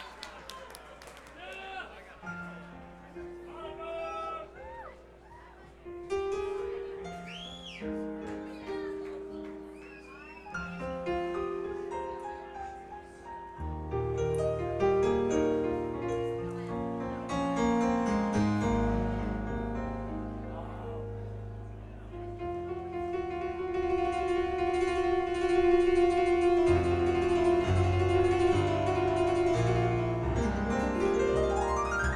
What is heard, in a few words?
keyboard solo